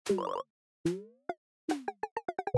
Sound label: intro hud